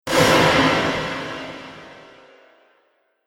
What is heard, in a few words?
Fantasy Explosive Impact